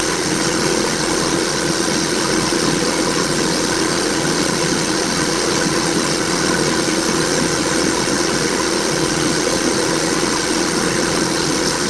blender

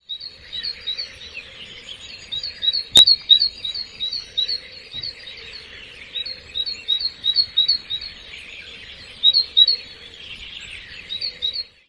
birds synth

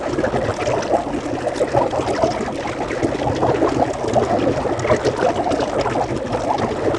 water

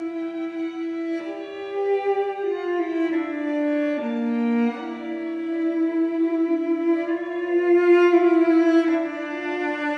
duo synth

